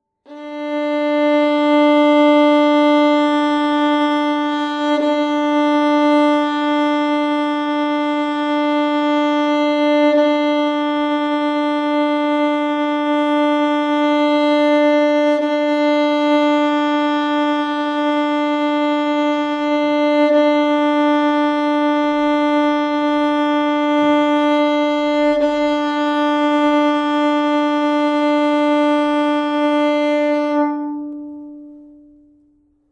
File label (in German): geige d saite stimmen